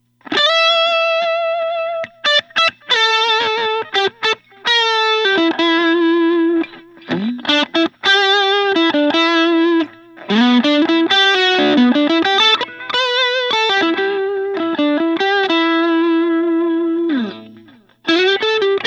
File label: lowden gl 10 koa